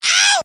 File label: "ow", ooh ahh, ow ouch